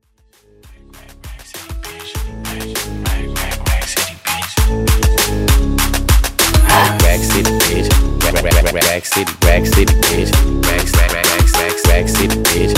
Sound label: Twerk To House Transition